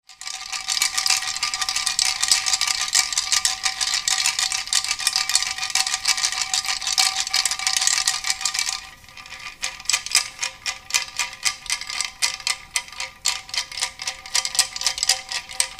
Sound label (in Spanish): jarro sonaja con silbato